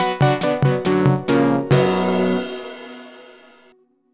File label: Jingle Lose; BGM; Build-A-Bear Workshop Welcome to Hugsville; nds